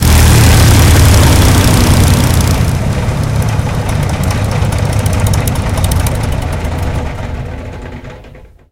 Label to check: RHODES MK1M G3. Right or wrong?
Wrong